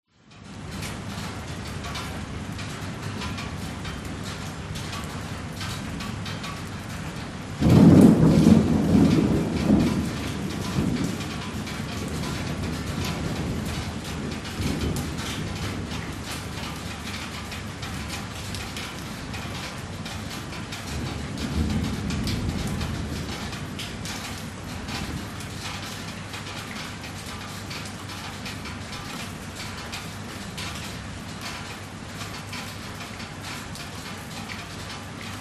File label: rain very light with drips dist thunder house metal roof ext